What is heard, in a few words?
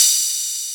Atlantex Music Percussion Computer Cymbal Choke